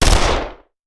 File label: Machine Gun; Sound Ideas; Guns